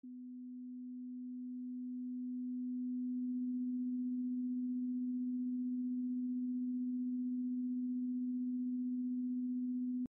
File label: 250 Hz